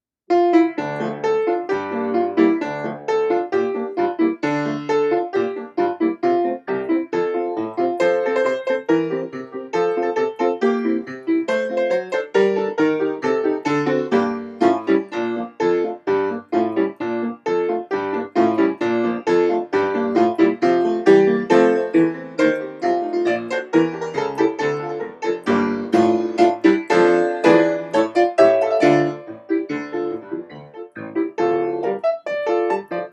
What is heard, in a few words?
improv D min, piano improvs; Noam D. Elkies piano improvisations